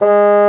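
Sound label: Bassoon mf G#2; Digital Sound Factory Virtuoso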